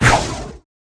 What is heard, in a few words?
Whoosh